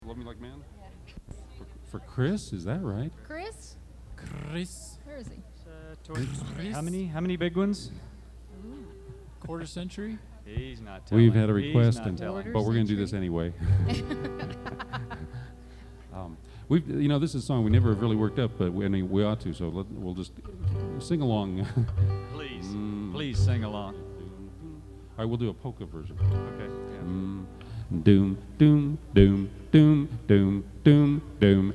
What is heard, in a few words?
happy birthday improvised